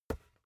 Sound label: basketball catch finger tips